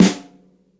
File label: ambient snare drum sample f sharp key